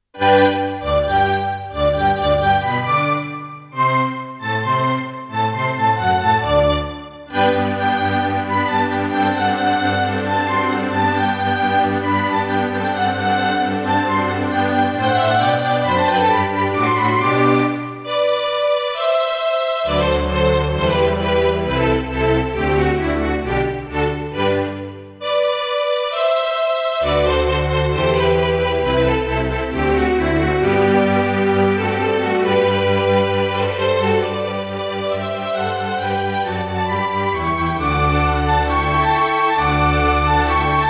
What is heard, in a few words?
Roland PMA-5; classical; Mozart; Eine Kleine Nachtmusik partial